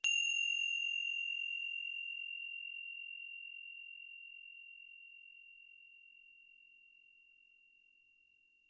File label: tibcymb distant weak sound